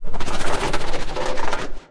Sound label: ballbot foley roll; player